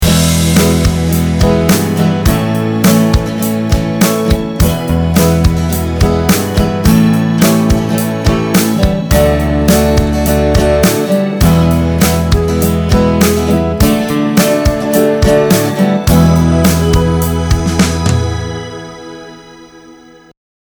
Gtr mit allen Instr; Logic

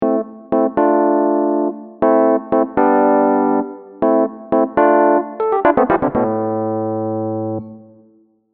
klassische Q

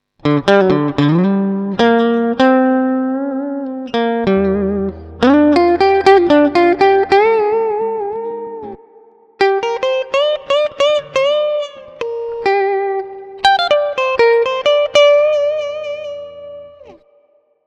solo pur; IK Multimedia AmpliTube Orange

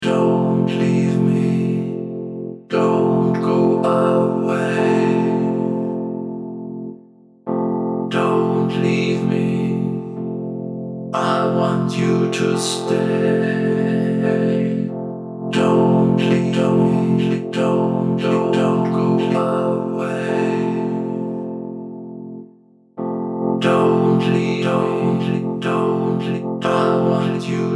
vokoder

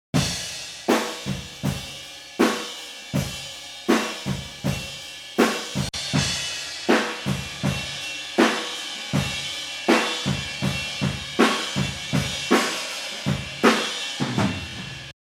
Overhead mit und ohne

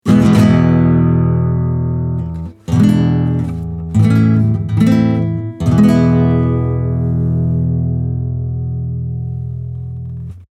Akkorde

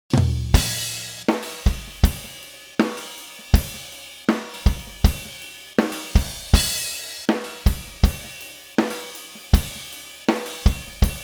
alle Spuren ohne und mit Bearbeitung

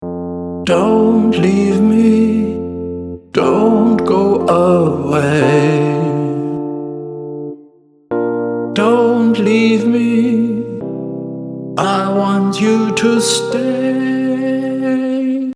F-Dur Flex

